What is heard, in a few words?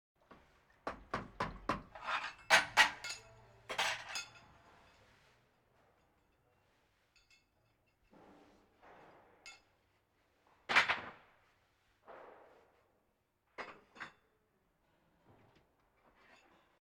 Animal effects snorts grunts farm ambience